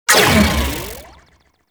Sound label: Mech C, Charge Gun; Cycron